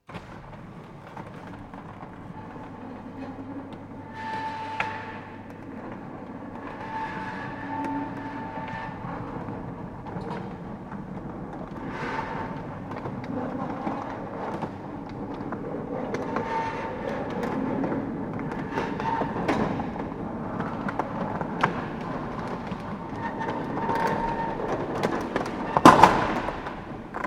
Industrial Mechanical Electric Door Large Close Perspective Closed; Metal Door Sound Effects